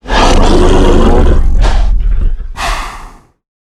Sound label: giant death; mutants; STALKER; customnpcs